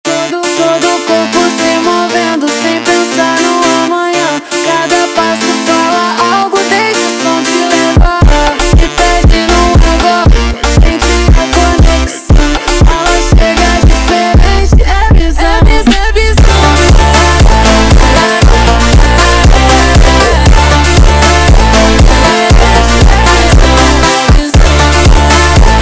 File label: Funk slowed